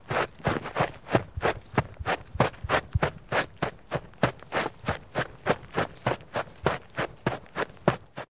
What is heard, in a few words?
Footsteps running on gravel